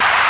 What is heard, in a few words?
slide